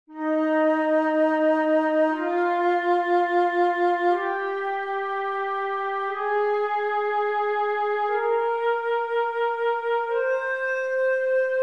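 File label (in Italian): mi bem maggiore, scala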